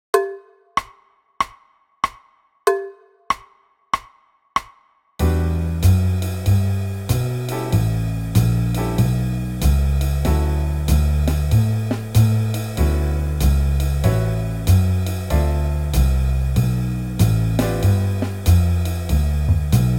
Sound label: tune up, guitar